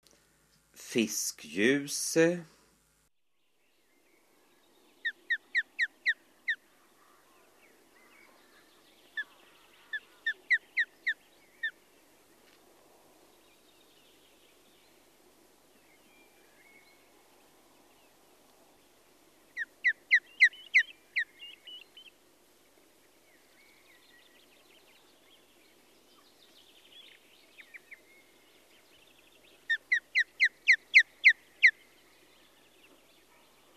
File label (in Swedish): Fiskgjuse